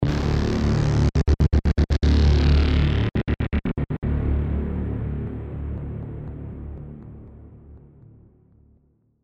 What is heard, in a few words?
Sound Design Tonal Hits; Cerberus